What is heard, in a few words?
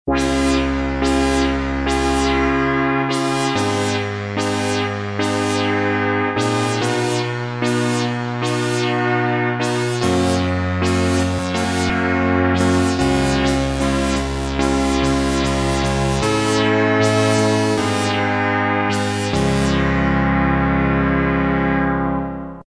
Reso Synth; Guess the synthesizer game